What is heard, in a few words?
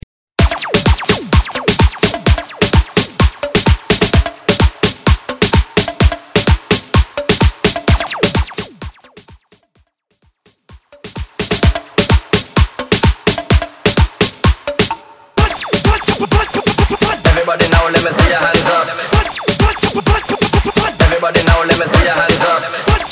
Tribal Mix; Mainstream VIP Hype Edit